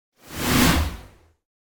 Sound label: Whoosh Strong Emission Short; Hollywood Edge; sound designer's toolkit v2